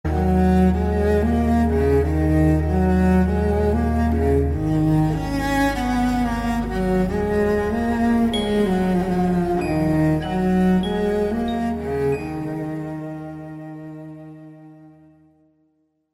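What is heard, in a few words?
bass violin music ringtone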